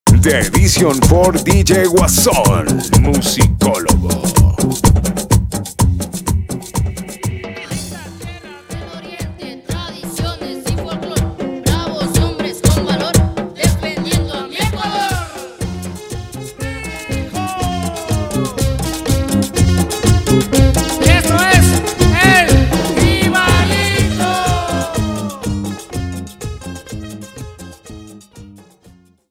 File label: INTRO EXTEND 125.000 BPM